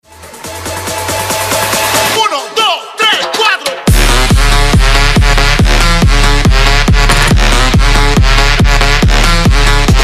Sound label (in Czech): hudba DISCO